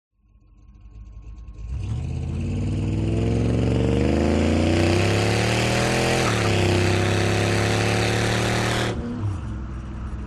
VINTAGE CAR Chevrolet 57 Racing Start Double Exhaust Close; TRANSPORTATION